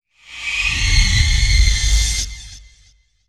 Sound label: Iron scrape scary screech